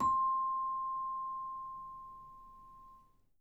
C6, Percussion & Harp; Miroslav Vitous

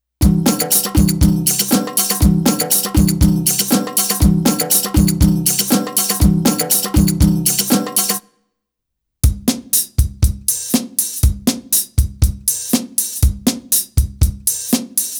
Arabic Rhythms

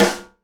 SNARE RIM, Live Snares

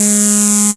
CREEPY A G#2, SEQUENCER; Best Service Alpha Dance vol.2

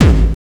hard bass drumz

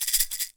PEYOTE, PERC; AKAI S6000 CD-ROM - WorldSounds

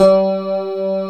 STRAT C4, guit; East West; Global Sounds; VFX GUIT

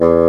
BASSOON E2; REED; AKAI S-Series CD-ROM Sound Library VOL-8

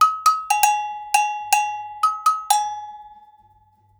120 AGOG, Percussion Loops, AGOGOS

